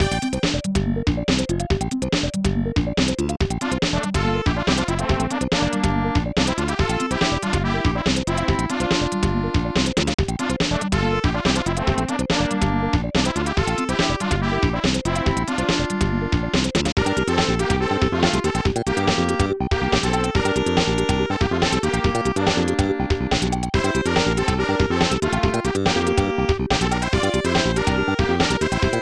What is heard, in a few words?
Battle; Yume Maboroshi no Gotoku; SNES